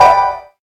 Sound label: serror